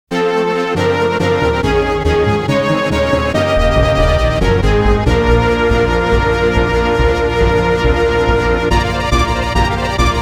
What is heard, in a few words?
Item Acquisition BGM